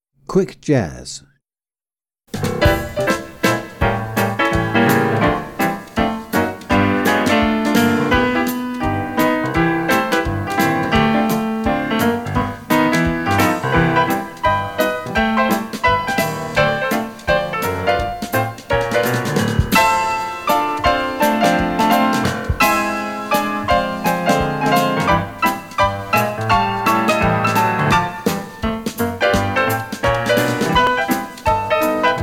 Quick Jazz, tap

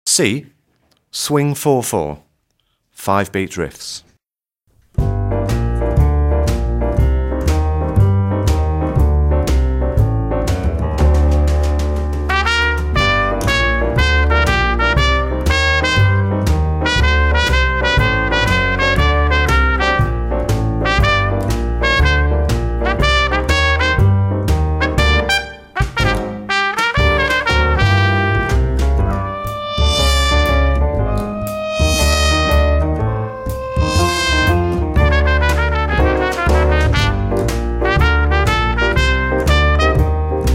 Swing 4/4 5 Beat Riffs, tap; tap grade 3

